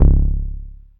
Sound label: Synth oneshot Short C#, Synth Bass One Shots; Slate Digital BASSHOLE Sample Pack